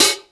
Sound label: Misc Hat; Mo' Phatt; Digital Sound Factory Mo' Phatt